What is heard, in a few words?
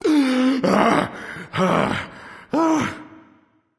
nv breath, vox; smite; voicepack; vulcan